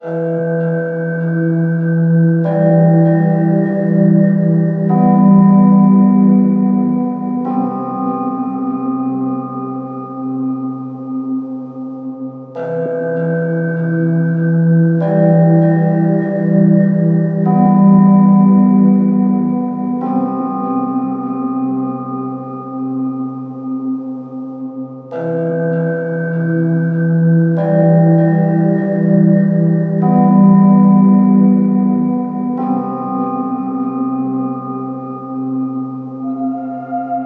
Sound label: Apartment Soft Loop; Hydrophobia